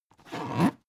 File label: dvd case pick up